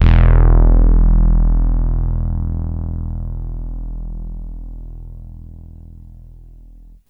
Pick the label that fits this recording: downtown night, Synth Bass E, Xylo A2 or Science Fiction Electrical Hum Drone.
Synth Bass E